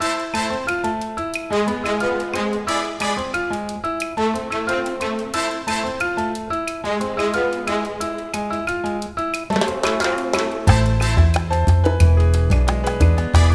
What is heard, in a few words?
casino music; Embargo